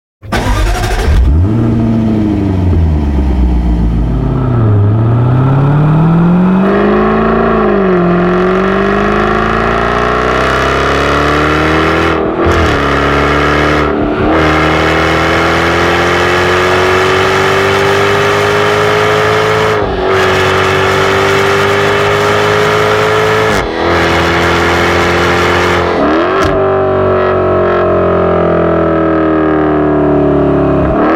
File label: Jaguar F TYPE V8 Soundscape